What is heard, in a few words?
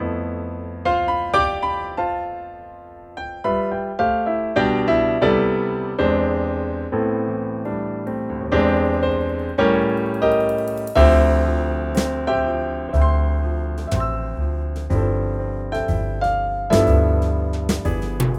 PA4X CONCERT GRAND